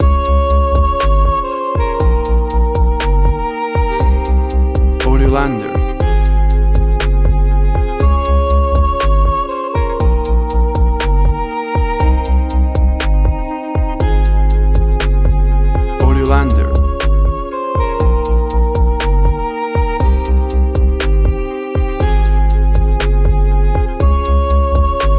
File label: TRAP SOUL